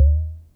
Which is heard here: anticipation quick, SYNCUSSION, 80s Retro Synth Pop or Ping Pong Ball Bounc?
SYNCUSSION